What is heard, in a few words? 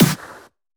Snares; Vengeance Samples